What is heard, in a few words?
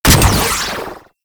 Heat Gun Shot; Warframe; Grineer